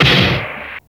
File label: STARTLE SNR; Drums In Your FACE!